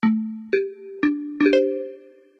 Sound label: login